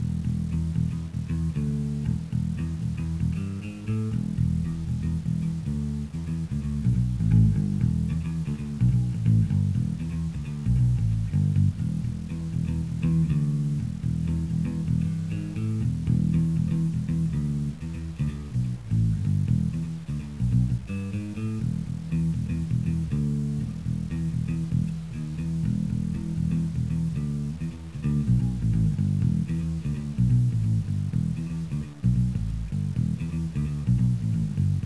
BASS

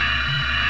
SCRAPE; THEXDER

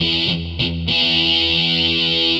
GUITAR, ROCK POP; MAGIX

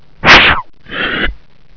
airburst

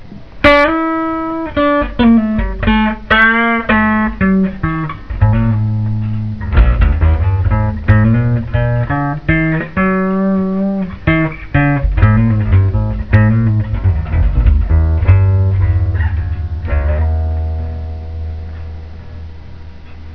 BLUES

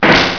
BANG

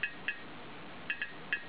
TAP

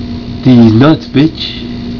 remote; WICKED

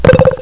SPINNER; Arcade

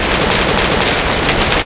RAIN

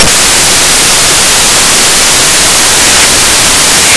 SCREAMS

